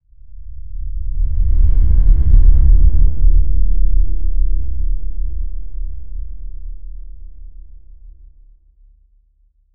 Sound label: a deep cinematic whoosh